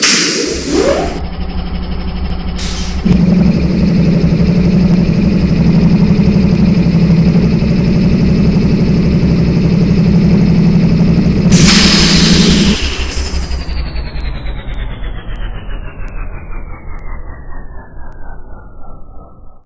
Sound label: compressor